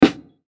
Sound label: hat